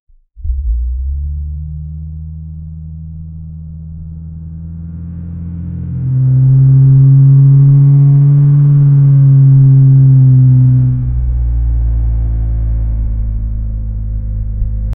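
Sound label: revving car with low bass; engine sounds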